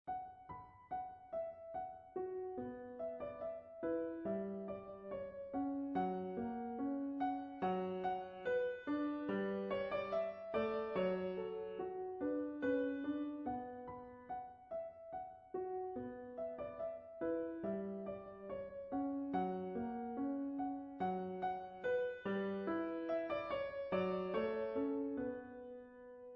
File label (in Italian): pianoforte